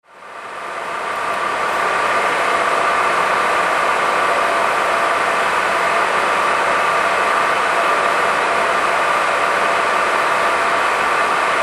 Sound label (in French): Ambiance Sonore Commutateur MT25, Commutateurs Électroniques Temporels 2G; Ambiance Sonore d’un Commutateur MT25 en fonctionnement.; Commutateur JEMMAPES 2 ET2 (AD06)